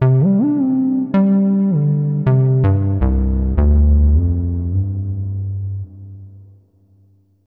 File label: SNTH BASS AMBNT 160 C; Ambient